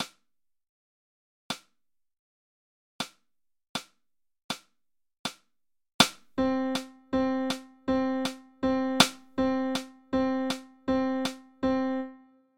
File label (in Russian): Пример восьмые на И